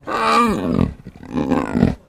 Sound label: Leopard Growl Groan; big cats